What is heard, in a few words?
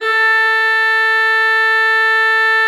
A4, Cathedral Organ; Propeller Island